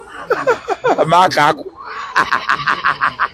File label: hehehe hahaha